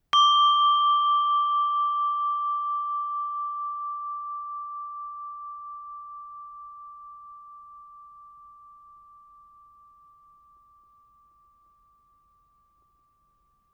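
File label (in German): Glocke mittel, bienenkorbglocke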